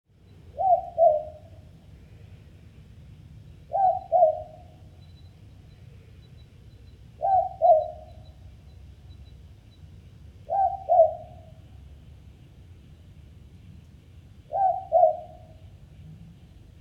ninox boobook

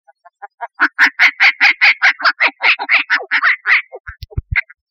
strix rufipes

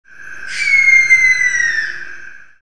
tyto multipunctata

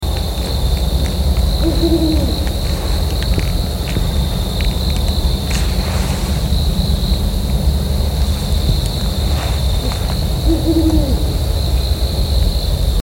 strix leptogrammica

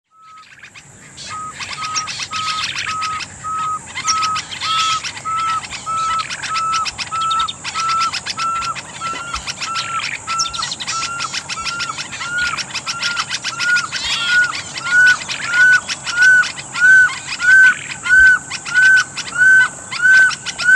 glaucidium perlatum

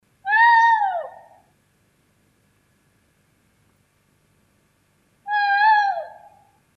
strix nebulosa

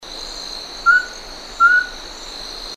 otus spilocephalus

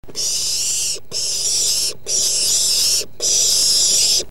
tyto alba

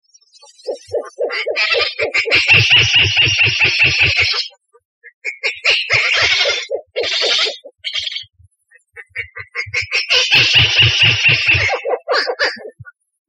strix rufipes

